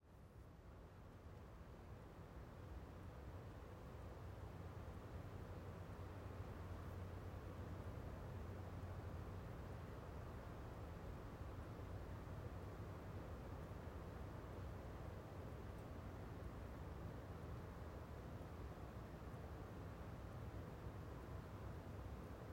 Outdoor Noise

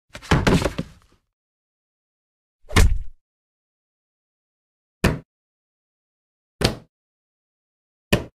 body impacts

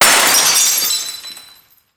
aggressive breaking glass C minor

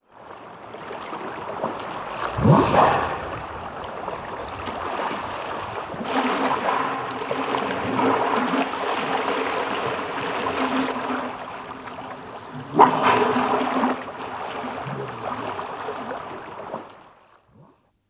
walrus; bbc

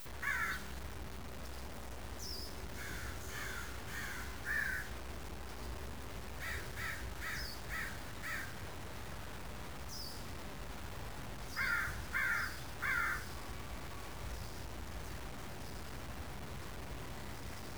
Crows & Bird Noise

